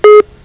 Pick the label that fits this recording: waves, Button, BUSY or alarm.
BUSY